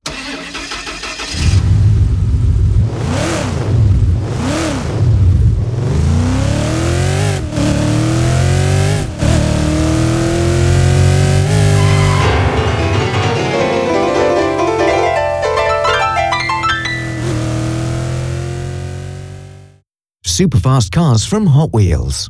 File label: Piano